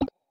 Ui Button Touched; hello kitty friends match; android

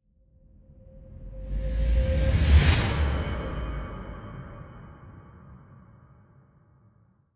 Music Trans Loadingstart; Forza Motorsport 6 Apex

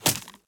Arrow Hit

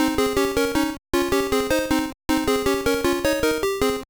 Fanfare; Frogger

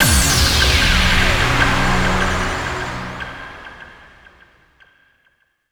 Music Decide; Syssd Music Decide Se Default Future

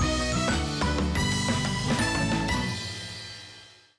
Jingle Puzzle Clear; Pbff Music#56 (Jingle Ig Puzzle Clear 2)

